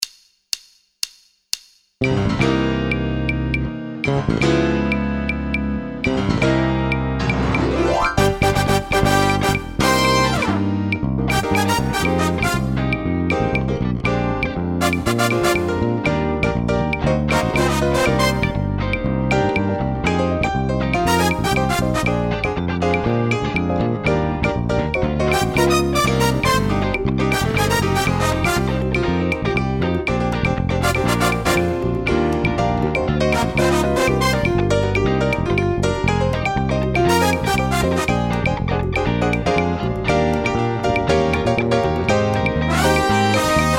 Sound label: Backing Latin